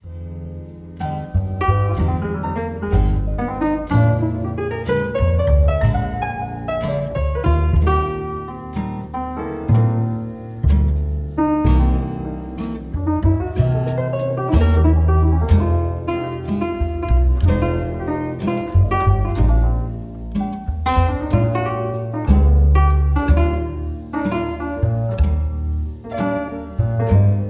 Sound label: jazzy improvisations